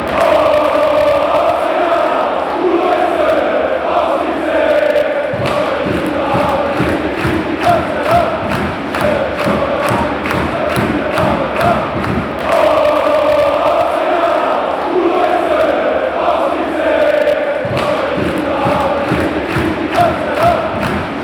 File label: rapid chant